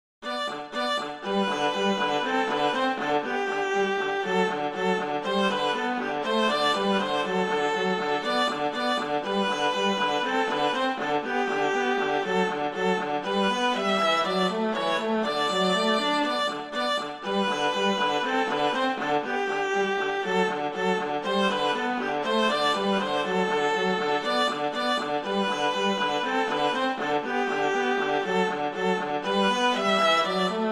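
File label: RONDO Moderato, Duets Viola; Pleyel; Duet 5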